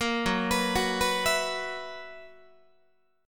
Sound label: Gb 11th, Guitar